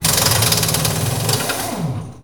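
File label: Impact big, air tools, industrial; Air toolks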